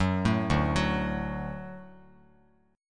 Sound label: D Major D down Bass Standard; D arpeggiated; JGuitar